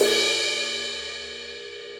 CRASH 18 edge crash, Cymbal; Ableton; Factory Packs; Drum Booth

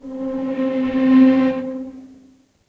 Long Super Sul Tasto - String Quartet; Instruments; Individual; String Quartet by Spitfire Audio

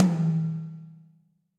Tom High Dark Plate Verb; drum hits; Ableton; Factory Packs; Session Drums Club; Standard Hits

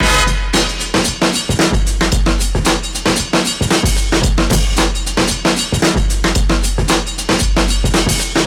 Drums, breakbeats; Head Spins; Ableton; Breakbeats by KutMasta Kurt

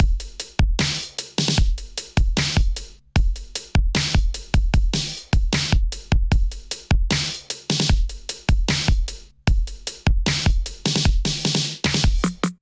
Kit 76 bpm, Drums; MIDI Clips; Nightly Kit; Drive and Glow; Ableton; Factory Packs

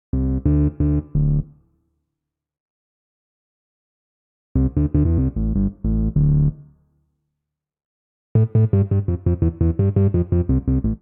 EP D Minor 87 bpm, Bassline; MIDI Clips; Tonal; Pan Party; Ableton; Factory Packs; Chop and Swing

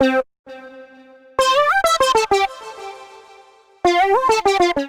Melody D Minor 94 bpm; MIDI Clips; Melodic; Evening Casual; Ableton; Factory Packs; Chop and Swing

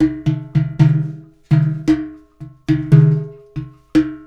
African Ogenne, African Percussion; World

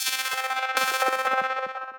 Synth Rhythmic; Sumptuous; Ableton; Glitch and Wash

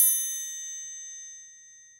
Triangle B Open, Latin Percussion; Drums; Drum Hits; Bell; Ableton; Factory Packs

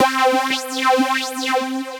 Pathos Synth Strinne, Synth Lead; Ableton; Factory Packs; Synth Essentials

